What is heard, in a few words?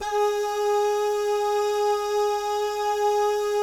BAH GUY, Vocal Planet Jazz & FX; ILIO; BAHS GUYS